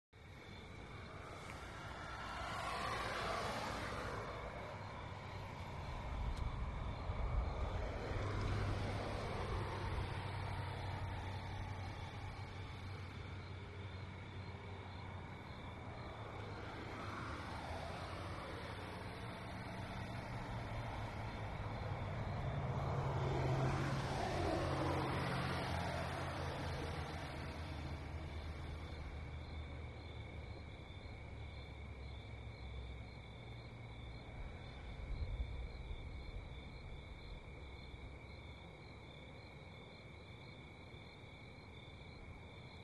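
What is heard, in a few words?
Traffic Suburban Night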